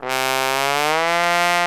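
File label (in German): posaune auf C3, trombone; Best Service; Alpine Volksmusik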